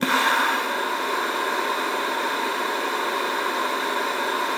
luft, alto sax; Xsample VOL-2

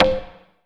SYN FX, FX PERCUSSION; AKAI S6000 CD-ROM - Volume 5

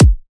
perfect kick

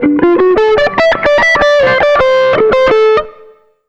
SOLO; Univers Sons; Jazzistic

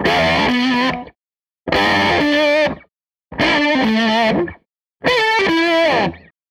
SG Guitar Loop

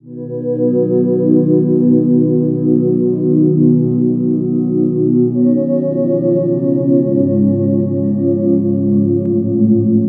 Ambi Cloud, Keyboards; Sonic Foundry (Sony Creative Software); World Pop

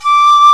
PICCOLO D2; E-MU Formula 4000 Series Vol. 5; Protozoa; Proteus2 Instrmt

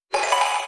arp with reverb automation; lead arp chord melody

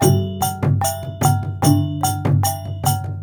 WYANG R, Heart of Asia; Spectrasonics - Heart of Asia CD2; W.KULIT

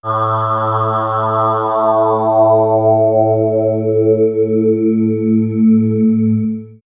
Swirls Face Vox, pads; X-Static Goldmine

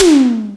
TOM ELECT

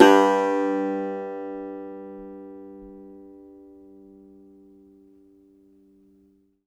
cumbus saz G, plucked strings

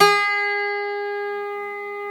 cister G#4; SoundBytes; Early Patches

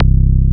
SUB BASS; Techno Trance; Synth Basses; E-MU Formula 4000 Series Vol. 2

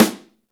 snare, Roland; Club-50 - Foundations Roland; Ext.Snare 1

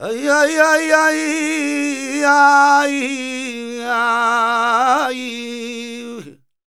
voc c#m, Flamenco Vocals Male Cantaor; Male Cantaor 2

